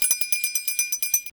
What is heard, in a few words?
bell ring